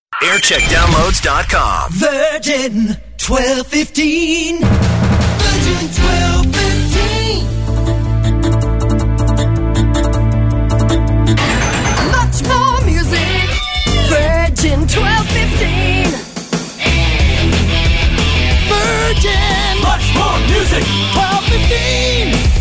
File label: Jingle Montage; Virgin 1215; Virgin Radio; TM Century